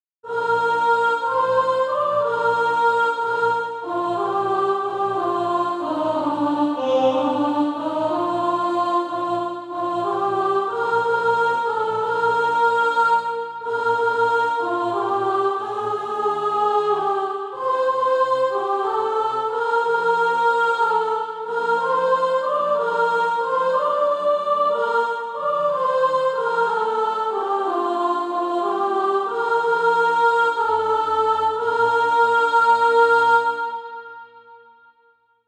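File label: soprano, Practise Music; Cradled All Lowly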